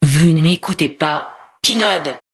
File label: jingle